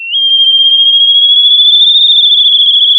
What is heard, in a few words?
pop, sound of a tea kettle whistling